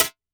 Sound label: CLAP, Vermona Mono15 Drums